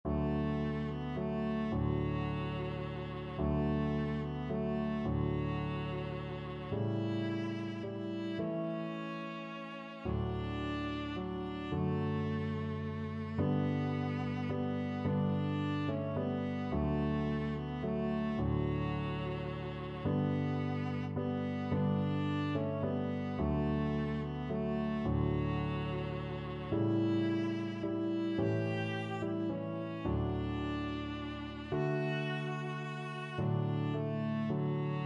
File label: silent night, viola